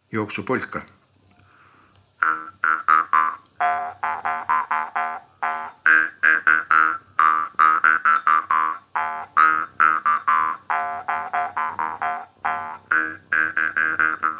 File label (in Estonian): Jooksupolka parmupill folk; Viru-Nigula